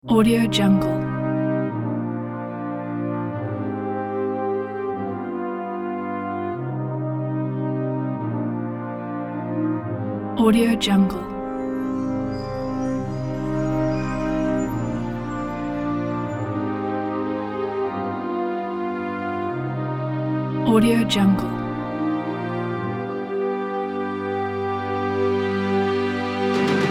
medieval battle

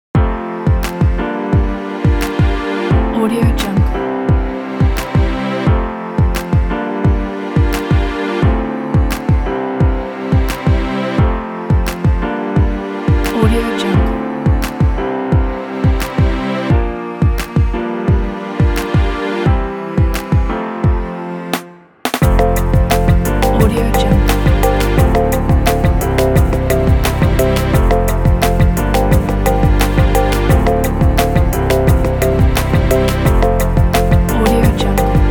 romantic travel background; moodvox

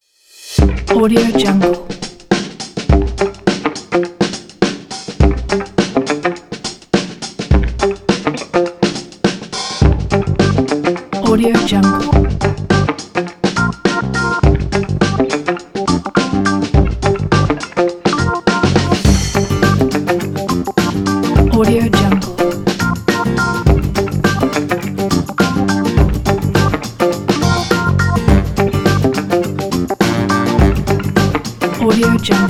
cooking is

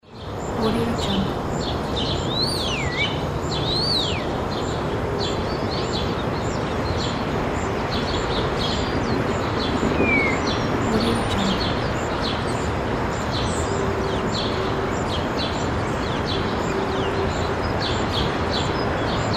city noise

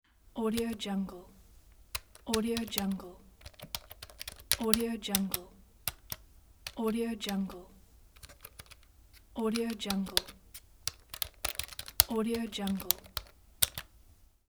computer keyboard typing; mehi-production

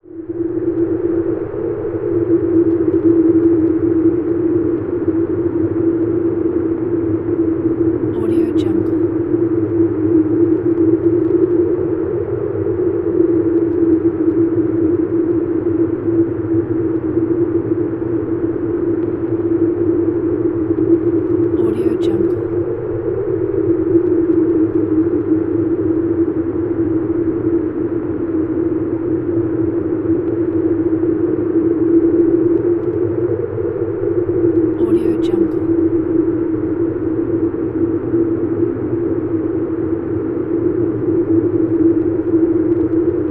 strong wind

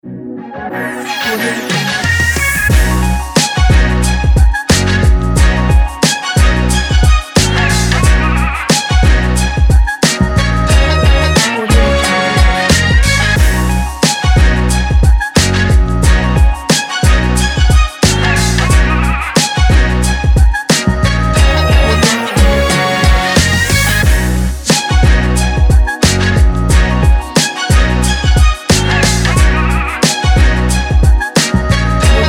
hip hop; blockbeatz

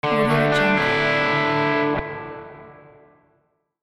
guitar introduction

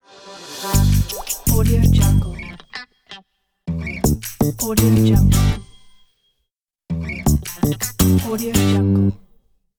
catchy funny funky jingles

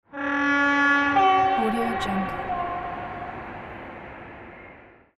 train horn

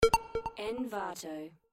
bonus points

